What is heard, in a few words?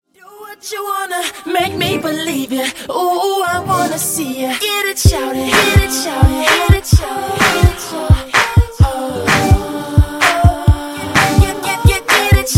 Funk Intro Outro 128Bpm